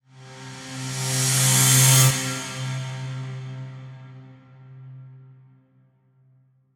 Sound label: Riser